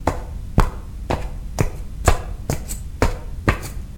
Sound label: walk sandal